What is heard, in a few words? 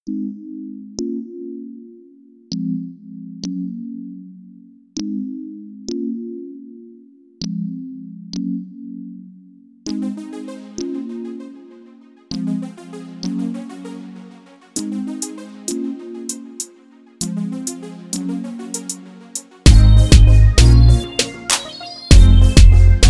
Instrumental